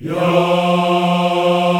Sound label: F 3, CHOIR YAS, Africa; Spectrasonics; Heart of Africa 1